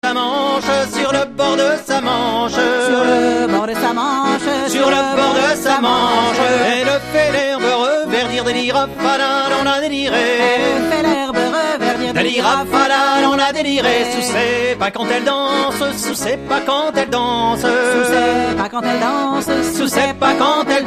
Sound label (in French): chant de haute bretagne, bal paludier; a-fet-noz